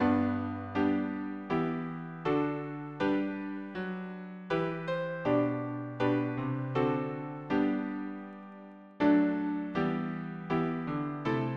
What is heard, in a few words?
harmony piano